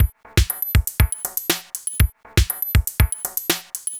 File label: Cicada Beat; Sonic Foundry (Sony Creative Software); Myths of Technology; Composite Beats